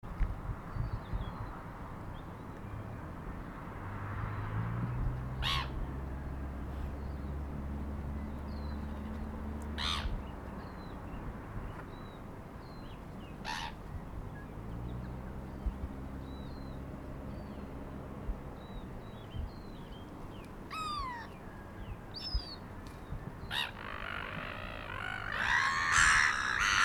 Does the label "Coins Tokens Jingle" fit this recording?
No